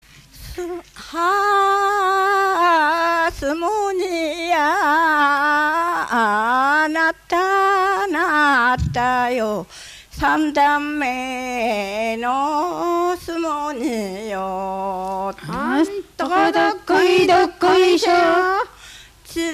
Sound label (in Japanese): sumo jinku; 相撲甚句; 山武市